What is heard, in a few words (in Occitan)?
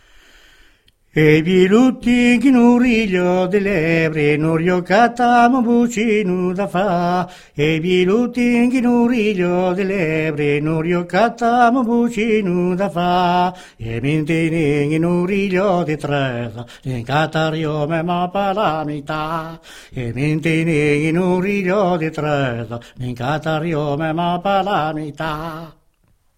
laurelha de lebre chant